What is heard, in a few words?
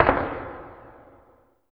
DISTANCE, FILM PERC; Drums In Your FACE!